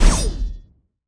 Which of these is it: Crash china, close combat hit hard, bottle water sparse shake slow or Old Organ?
close combat hit hard